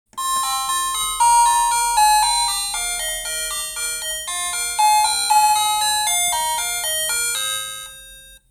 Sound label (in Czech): melodie